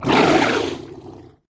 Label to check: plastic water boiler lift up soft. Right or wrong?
Wrong